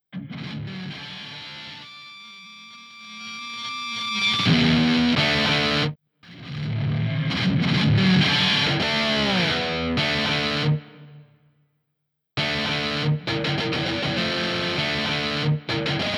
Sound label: Guitar Solo